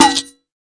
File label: Processed Ethn Perc, Doru Malaia Percussion